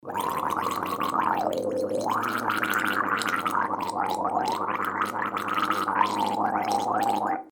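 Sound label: gargling sound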